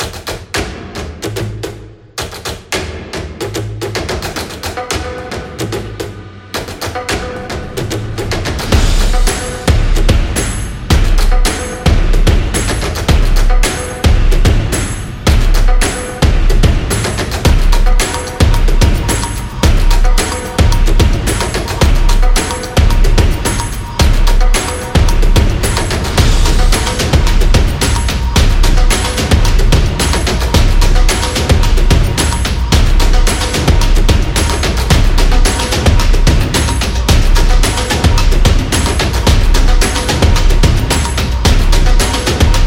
Percussion Trailer Drums; sport drums

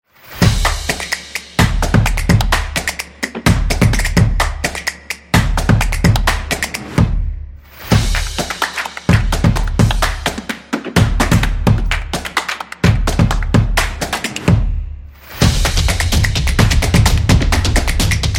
The Percussion

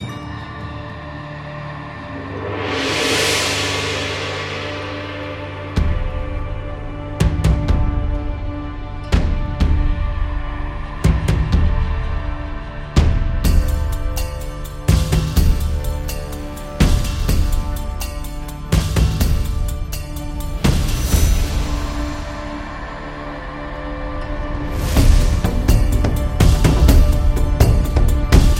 Chinese Percussion; chinese new year

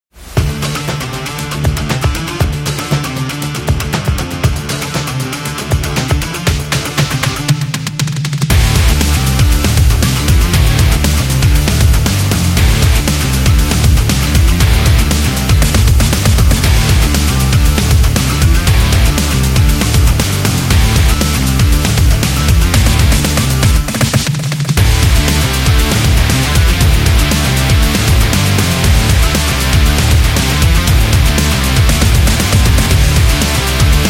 Sport Rock Energy

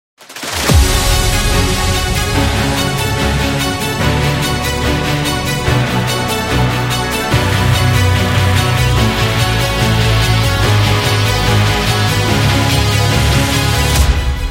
Tech Rock

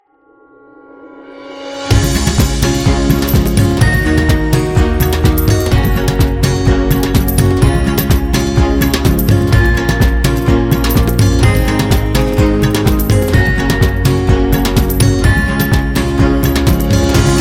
India Wedding

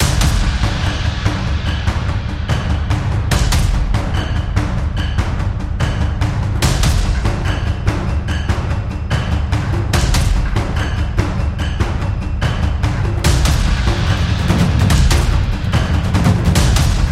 Taiko Drums Action Epic Trailer

